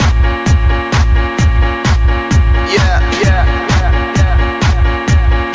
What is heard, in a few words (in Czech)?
hudba; cstrike